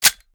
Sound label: uncook; tacz; daffas arsenal